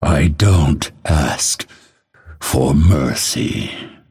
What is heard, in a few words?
death; dota; phantom assassin